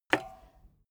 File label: bmx bike pedal foot hit regular